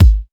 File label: dope kick single hit g sharp key, bass drum single hit tuned to the G# note